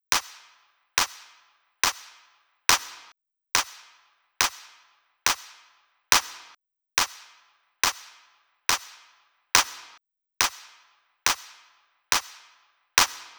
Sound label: drum Loop 140BPM Clap